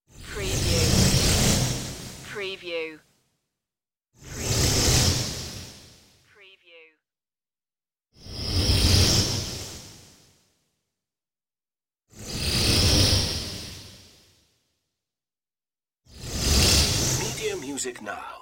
shimmer space flybys

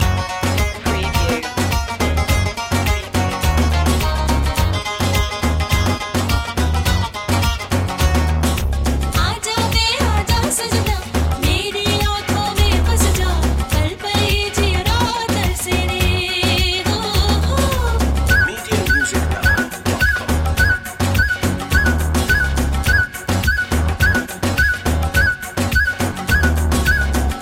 bollywood reggaeton loop; Royalty free music with Indian influences ideal for creating an exotic ethnic feel.

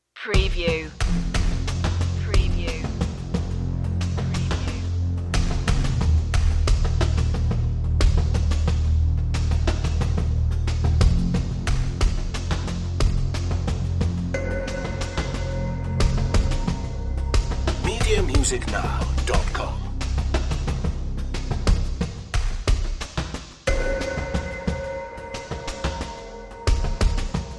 jungle robots

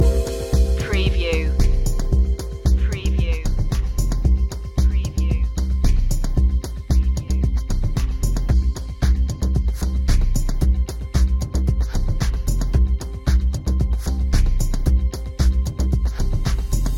corporate adventures loop

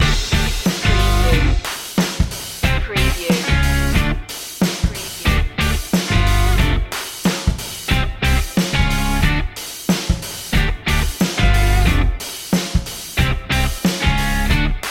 slam the door loop

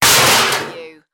Impact Garbage Can Lid

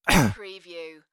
Male Cough; beanstalk audio

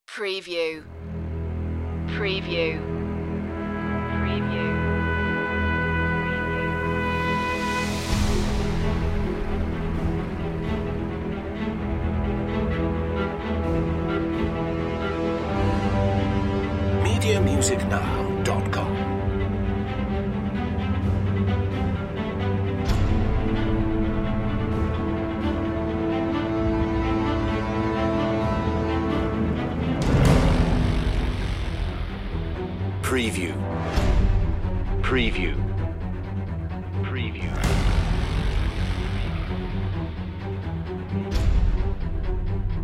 dancing with the enemy no electronic drums

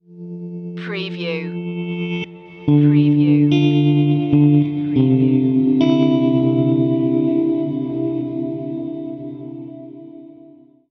slow down stinger

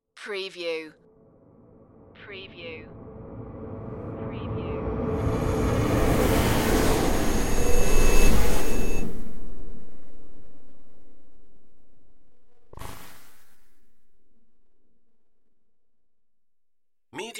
power glitch flyby

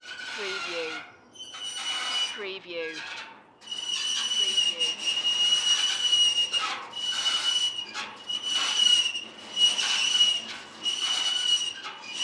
Windmill Squeak Metal; Sound Effects Bible

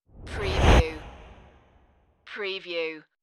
Rush

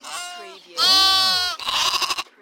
Lamb bleating; beanstalk audio

